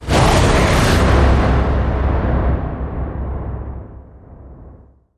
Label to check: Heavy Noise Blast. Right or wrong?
Right